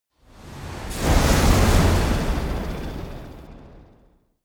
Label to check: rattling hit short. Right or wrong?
Right